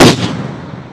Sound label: lancer fire